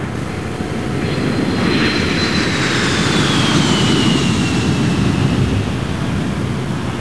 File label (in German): laermton